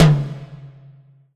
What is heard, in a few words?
Mid Tom; Korg N1R